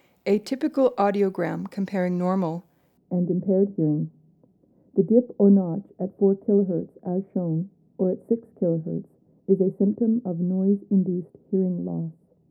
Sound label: Audiogram